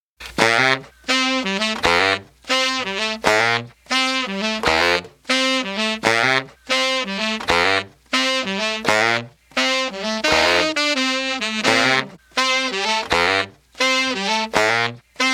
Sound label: sax dry